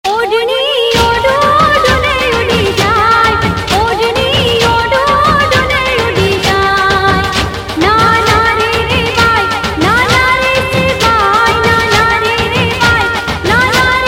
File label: gujarati garbo; ringtone